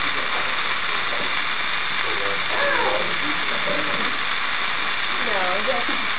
lobby with ghost box noise evp; summit ridge

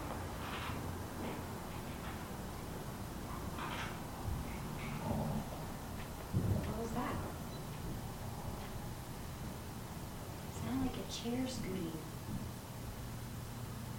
chair creaking and scooting

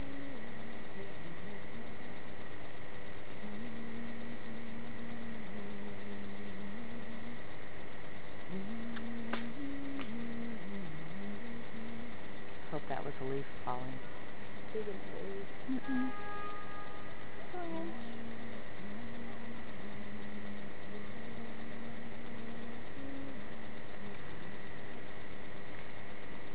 spook light

